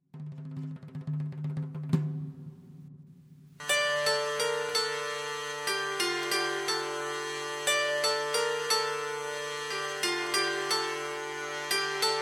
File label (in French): roi tambour